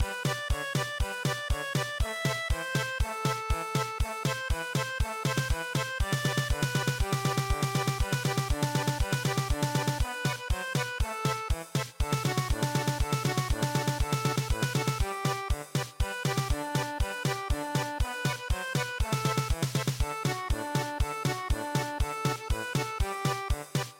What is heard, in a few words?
scatter morning dew German Polka